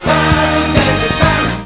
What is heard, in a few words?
burn; svencoop; shell